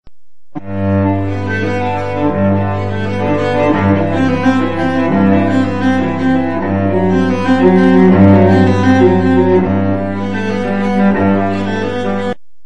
Bach Cello Allemande

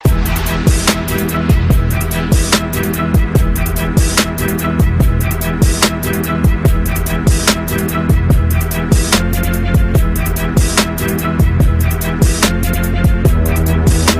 black beat, hip hop

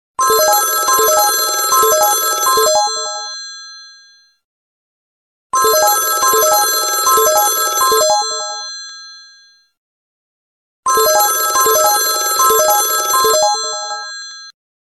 mixed tones; ringtones

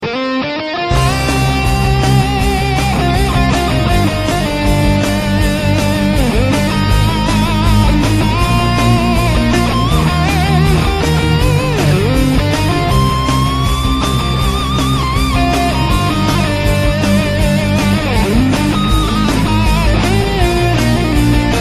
guitar; saint seiya; electronic

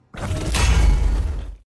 hammer

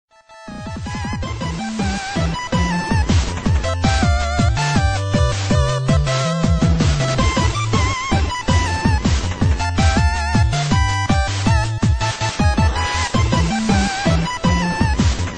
8bit; Steins Gate

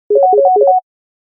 short office sms; ringtones

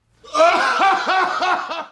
Laugh

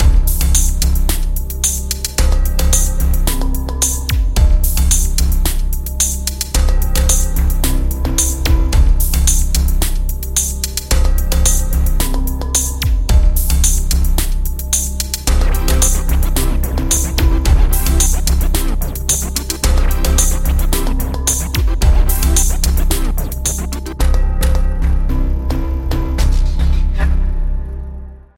Downbeat Electronica

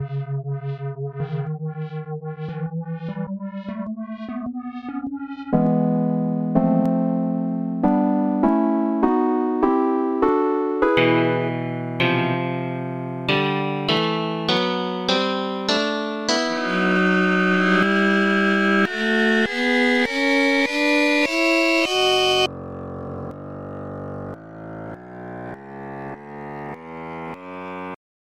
C minor Scale

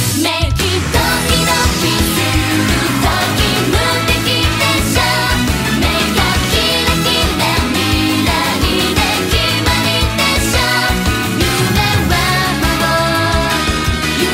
8bit

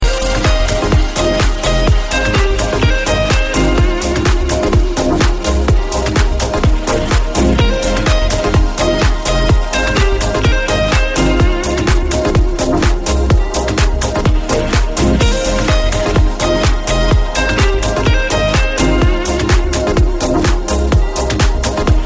Trance Ringtone

